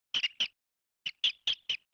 Noctula maternal call type 0 distress calls audible